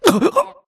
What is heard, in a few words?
grunt death neck; Titanfall 2